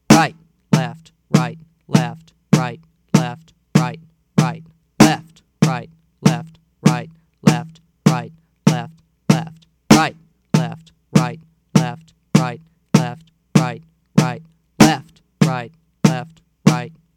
Rudiment 5 Fast

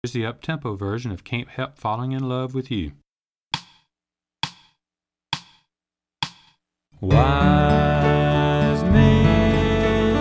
Fast

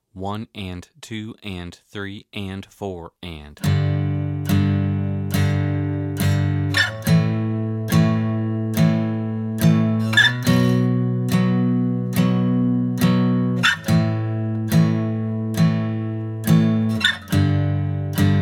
Power Chords On The 6th String

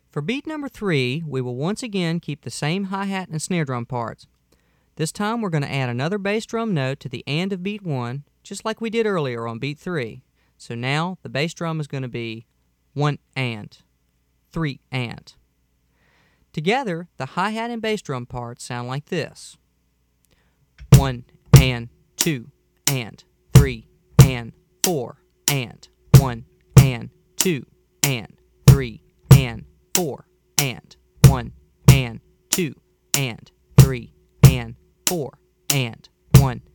Beat 3

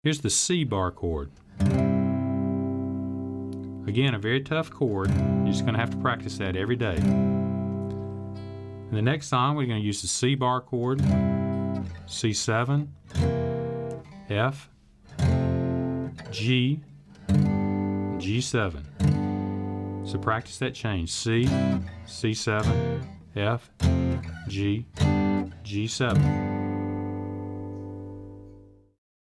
C Barre Chord

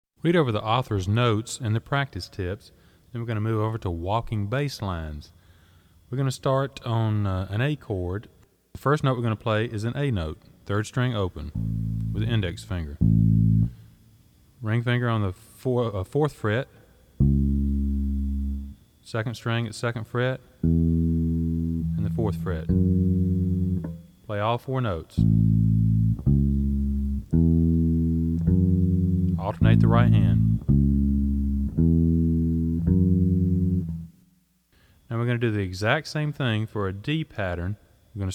Walking Bass Lines

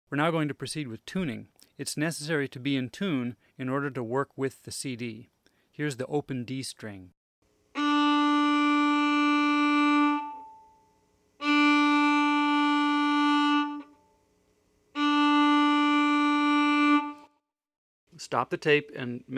Tuning

